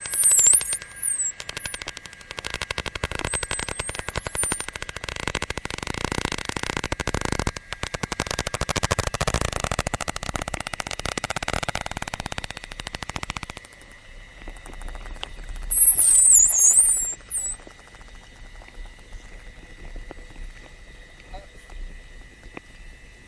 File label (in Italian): Vocalizzazione Stenella striata